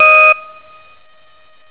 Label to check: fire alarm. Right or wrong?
Right